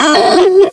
Stalker Death

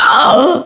Siren Punch; Carol

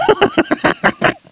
Gore Roar

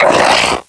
Pain; KF Christmas; Patriarch

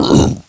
Bloat Jump; Bloat Santa

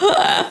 Siren Fear; Carol

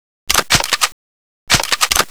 PNut Reload

